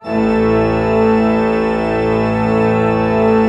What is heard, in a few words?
A1, Cathedral Organ, PED VOL WERK; Propeller Island